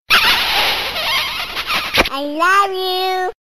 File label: Kiss